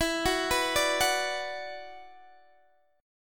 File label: E 7th Suspended 2nd, down, Guitar, DADGAD; E7sus2; arpeggiated